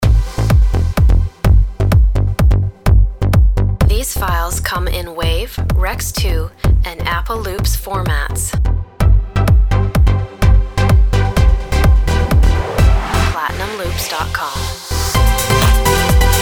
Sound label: house bombs voice duck